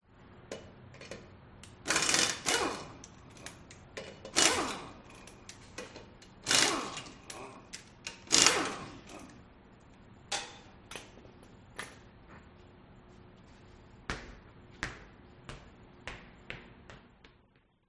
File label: industrial mechanic remove wheel car